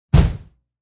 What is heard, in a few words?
Smash Hit; Multimedia